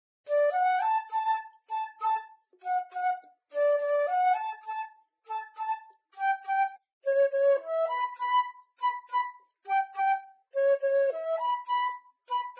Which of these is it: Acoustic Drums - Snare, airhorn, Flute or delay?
Flute